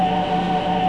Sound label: Motor, Train; KCSV7 csatolt